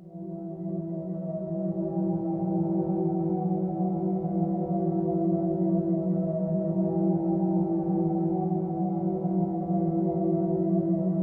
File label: Pad C, Synth Prophet One Shots; Electronisounds